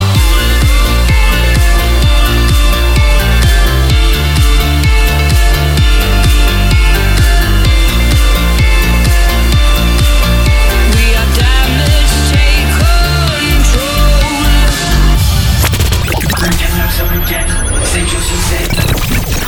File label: jingle; hitparty